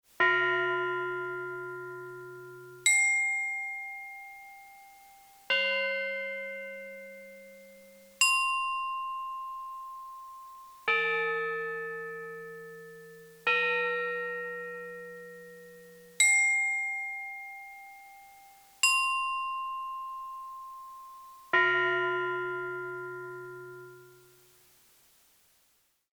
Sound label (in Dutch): klokken